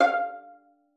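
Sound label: violin pizzicato F5; Xsample VOL-5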